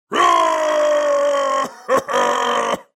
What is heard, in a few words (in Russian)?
zvuki orkov